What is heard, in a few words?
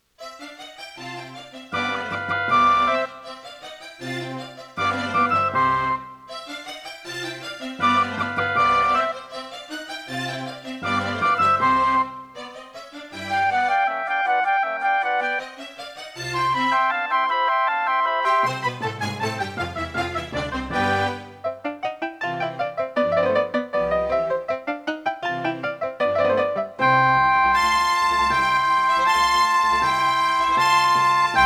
Roland CM-500; classical; Mozart; Concerto K467 partial